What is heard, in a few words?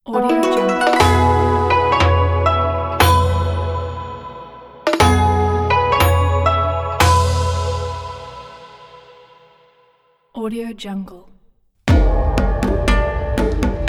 japan logo pack